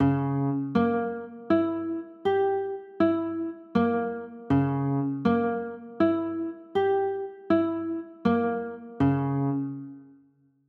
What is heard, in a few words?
d flat dim7, chords